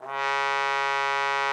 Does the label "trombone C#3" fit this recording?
Yes